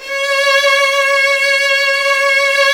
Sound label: VN C#2, CHMB STR, Virtuoso Strings; ILIO; Kirk Hunter Virtuoso Strings VOL-3; 90 s Sample CDs; CHMB STR ESP